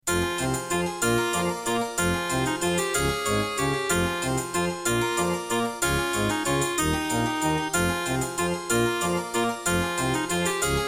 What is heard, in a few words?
Track 6, Yamaha MU2000EX GS MODE; Death Gate